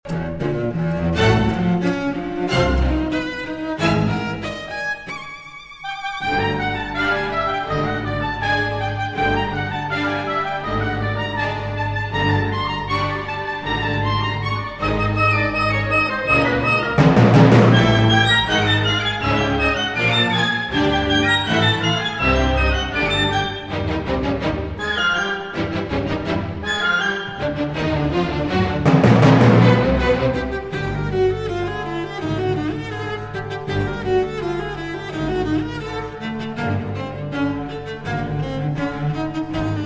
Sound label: Cello Concerto in E Flat Major, Allegro Con Moto, Live